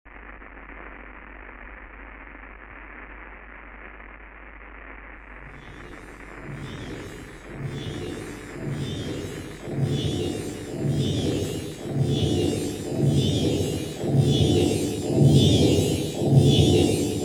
Radio Contact With Scary Space Aliens

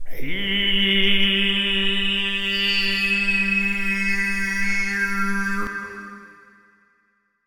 Mongolian Overtone Practice

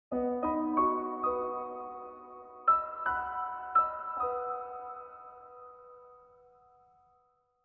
Piano mood happy

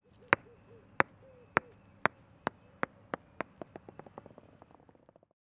Golf Ball Bounce

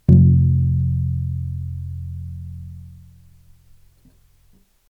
Cello sample

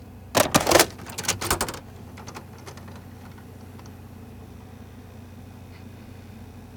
Panasonic Pv V4020 Insert

